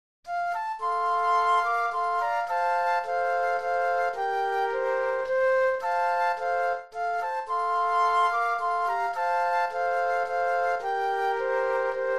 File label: Flute Trio